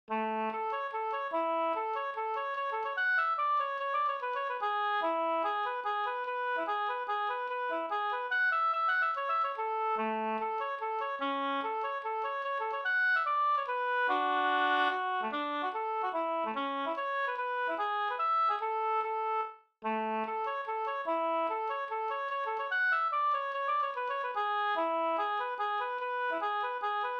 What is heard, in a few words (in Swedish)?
mazurka; På sörmländsk loge, mazurka av Ivar Carlsson, Stockholm, född i Björkvik, Södermanland